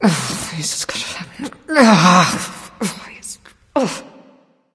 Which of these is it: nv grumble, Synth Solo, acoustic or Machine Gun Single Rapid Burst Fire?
nv grumble